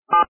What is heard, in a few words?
DTMF 7